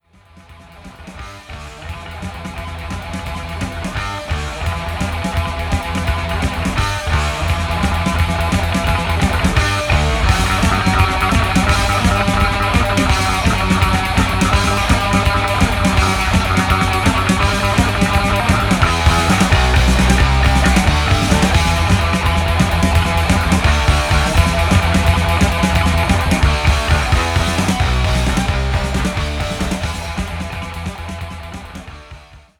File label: metal